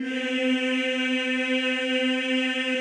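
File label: choir C3, men; Sound & Vision - Gigapack I CD 2 (Roland); Men-Combi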